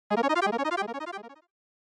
SNES Fighting Select

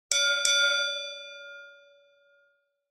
Boxing Bell

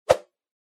Motion Swish

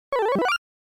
Arcade General Pitch

